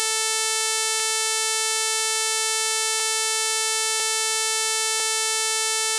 flattest waves full

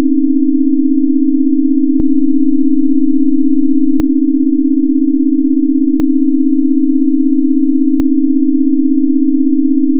buzz

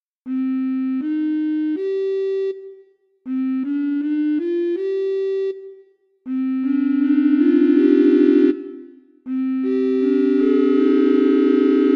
flat diatonic

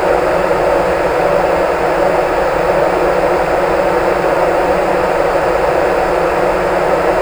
real person noise

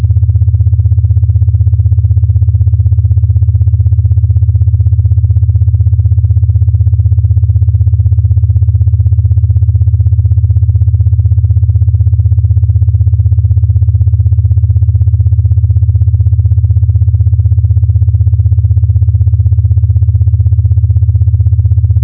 567 sines 0-90-180

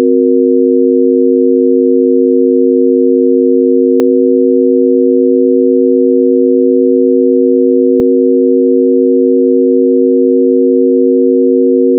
buzz tempering

